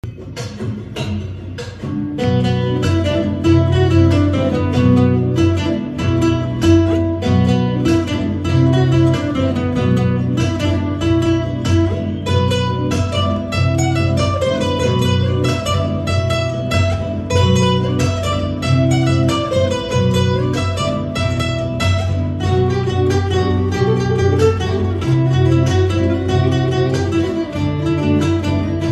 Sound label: Instrumental Version With Guitar